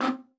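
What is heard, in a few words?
C4, bass flute; Xsample VOL-2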